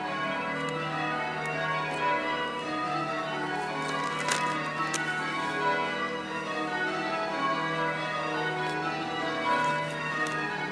A Saturday, Spring Soundscape: Old St Martin's bells, gardening and street ambiance